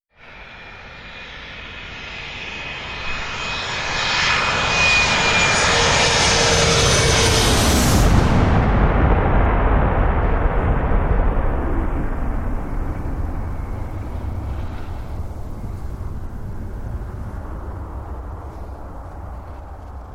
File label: Authentic Jet aircraft crash; Explosions